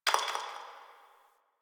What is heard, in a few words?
Brick Crumble; Warframe; Ambience; Nineteen Ninety Nine